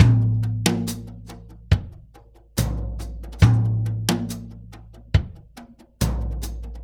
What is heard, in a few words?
Earth Loop, Drum Tools, Laid Back